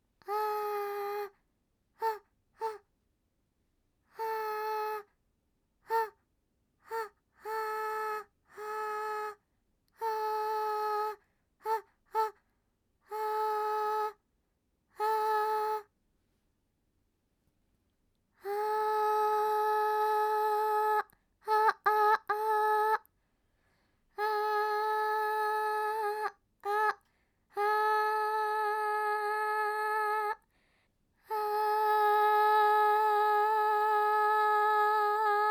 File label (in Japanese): あーーーーーーー, バイノーラル